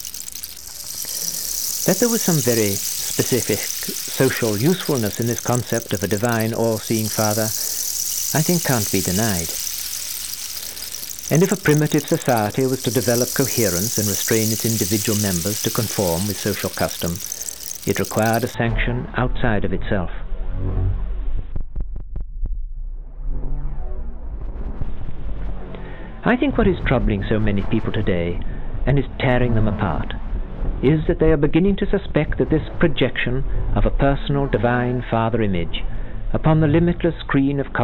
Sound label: rain stick, philosophy, electronic sounds, drum cuts